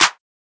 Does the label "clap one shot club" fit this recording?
Yes